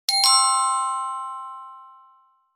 Sparkling, notifications; China Telecom

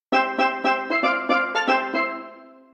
Steel Drums Jump; Asus Zenfone-10; ringtones

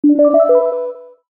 Voicemail, notifications; Tinno ATT Calypso 3

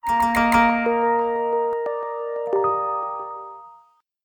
Romantic, notifications; LG Swift L9

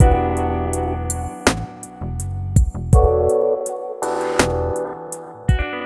RnB; Google; NewWave Labs

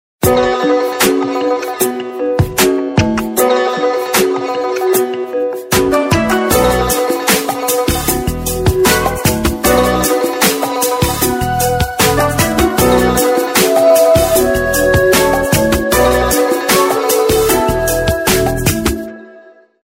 Ringtone; Samsung GT-S3500H

